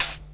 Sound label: clean hip hop hat sample d key; hat samples